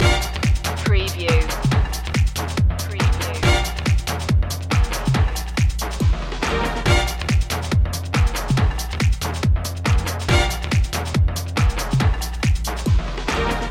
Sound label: shuffle kerfuffle loop